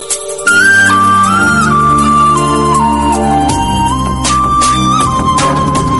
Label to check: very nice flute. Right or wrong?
Right